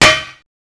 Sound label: Puzzle CLANK; only the brave can rescue the kidnapped princess